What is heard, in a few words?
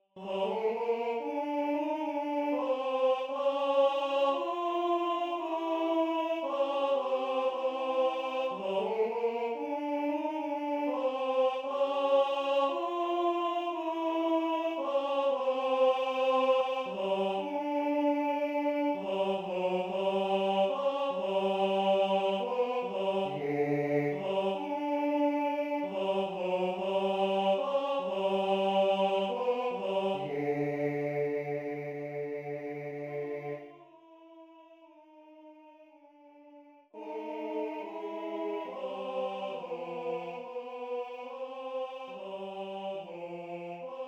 alto; this boat is made for you